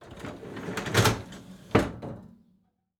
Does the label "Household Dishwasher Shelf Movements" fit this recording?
Yes